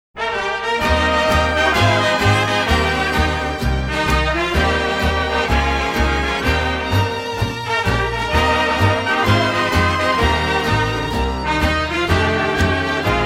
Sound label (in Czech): Směs melodií